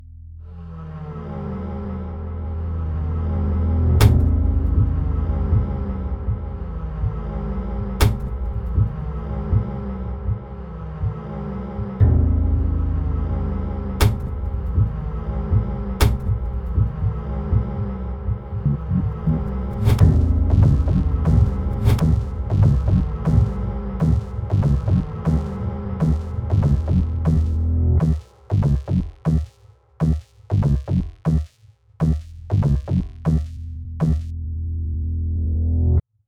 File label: invisible sound bass